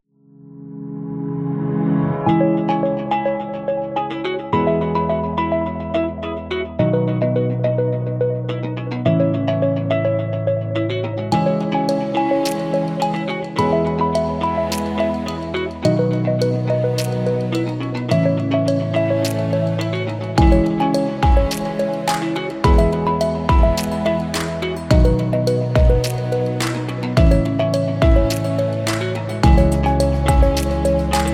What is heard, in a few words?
Elegance; corporate music vol 22